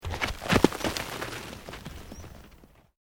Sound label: ROCK TUMBLING; Material components for constructing more complex sounds; Rocks; Materials